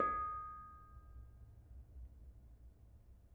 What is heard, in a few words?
Bonang Barung soft, gamelan; gravityharps; timbrebox